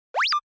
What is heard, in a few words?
Motion Pop